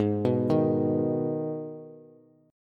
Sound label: G sharp Minor G sharp down Bass, chord; G#m arpeggiated; JGuitar